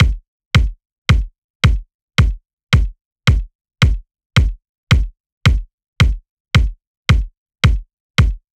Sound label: Nu Disco Kit 110bpm Kick; EDM Drums; Drum Kits; Stems; Ghosthack; Ultimate EDM Drums; Nu Disco Kit 09